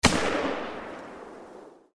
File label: shoot